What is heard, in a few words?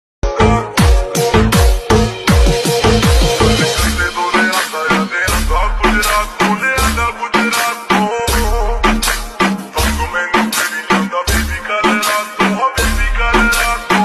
slowed Lofi punjabi ringtone